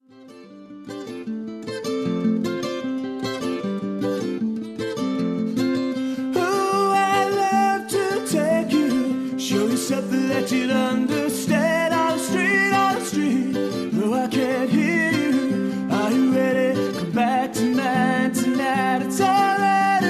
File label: Guitar Voice